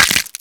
bones snapping

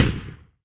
gun stop, p40; wac

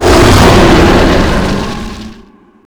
shout long

